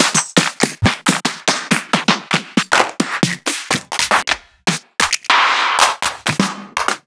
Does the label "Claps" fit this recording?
Yes